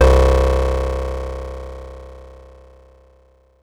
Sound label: C2, Synths; Multisamples; Tempo Steppe; Ableton Live 12 Lite; Core Library